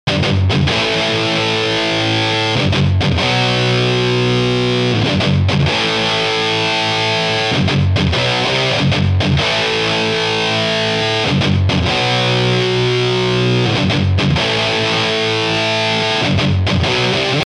Intro Guitar Right

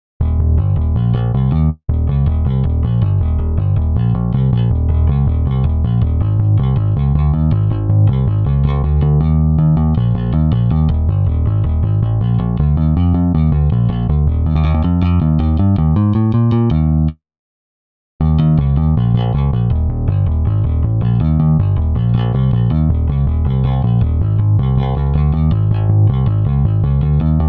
Bass; Mr. Tabs

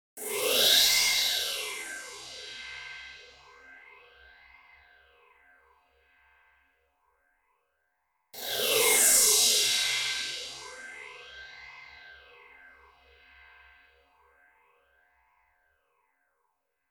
Phaser Cymbals

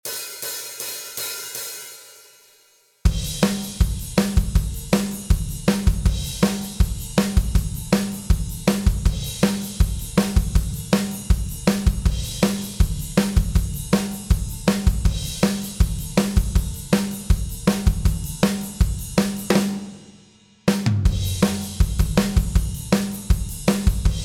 Drums

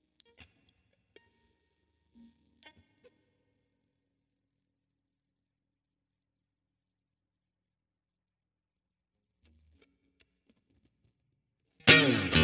Guitar Verse

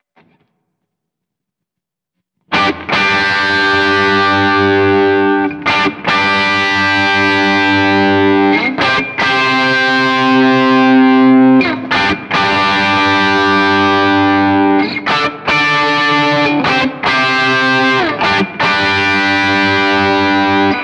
Guitar Middle

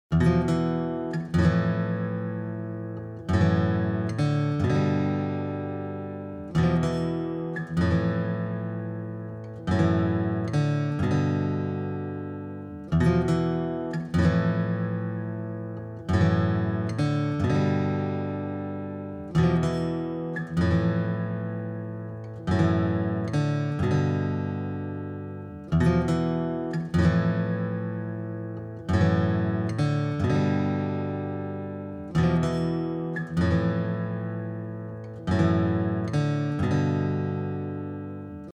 Acoustic Intro; Mr. Tabs

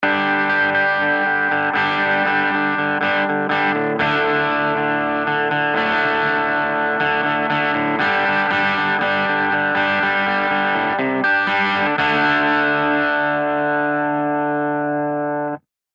Intro Guitar Left

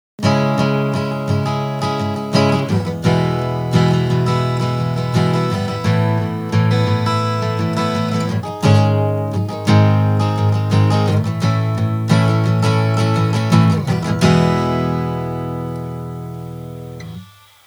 Acoustic Right, guitar

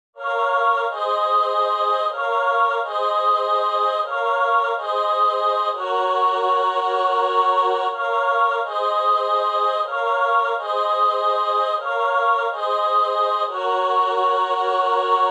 Synth; Mr. Tabs

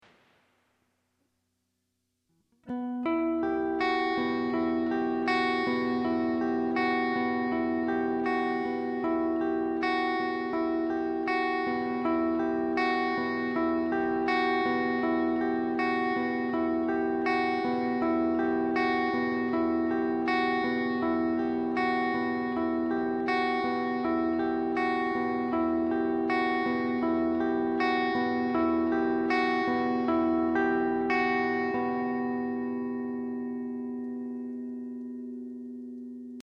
Intro Guitar

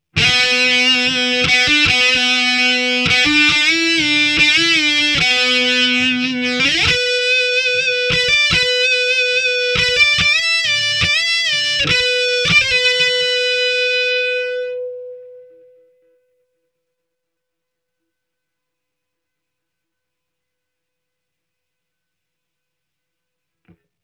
Intro Harmony Center Count in, guitar

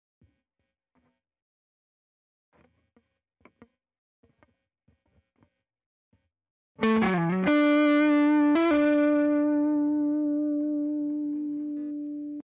Crunch Guitar Fills

